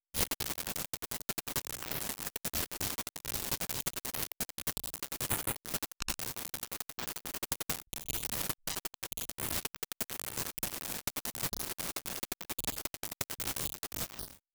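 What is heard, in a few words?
glitchy texture; ambient or nature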